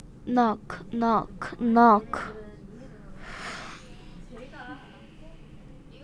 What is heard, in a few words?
knock